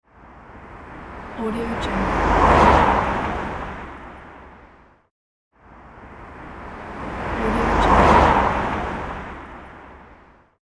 car pass by

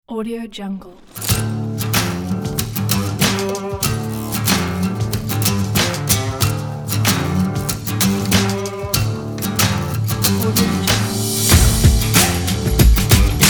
western driving rock

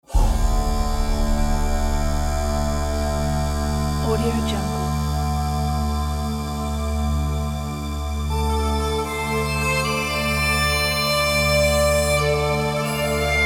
irish uilleann pipes

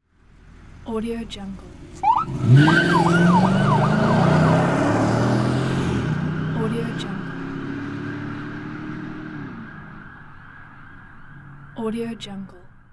police idle then accelerating with siren

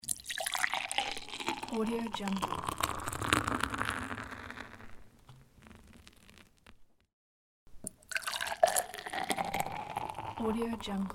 pour beer; tibasfx

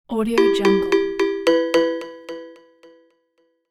original new message

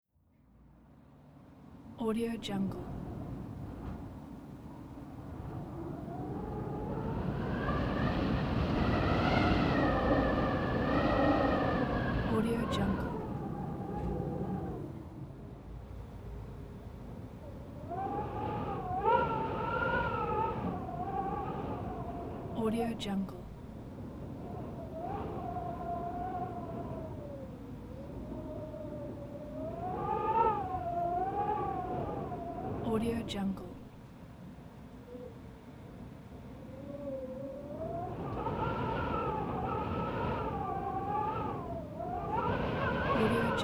cold wind howling

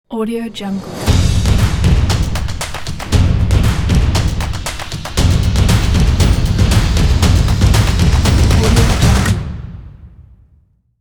stomp logo

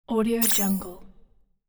negative arcade game item

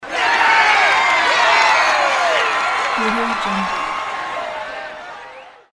crowd cheers

